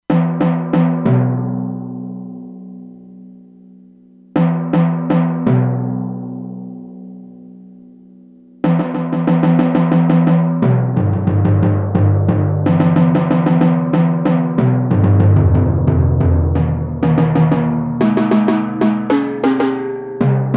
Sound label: Timpani, E-mu Proteus-2; Factory Presets